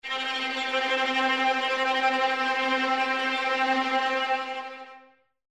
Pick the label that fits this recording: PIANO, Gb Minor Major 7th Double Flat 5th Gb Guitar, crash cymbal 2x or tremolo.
tremolo